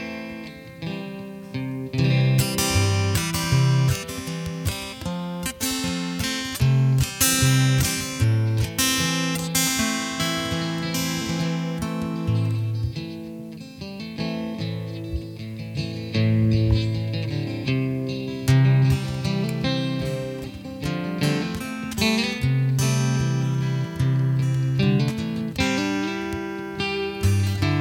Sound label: Jam